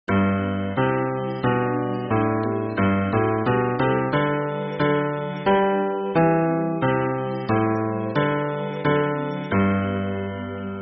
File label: hymn bass